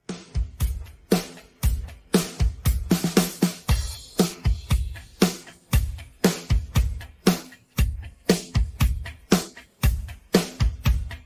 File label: drums